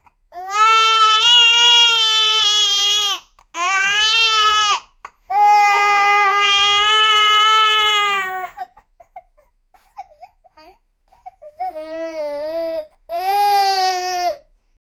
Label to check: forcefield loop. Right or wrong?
Wrong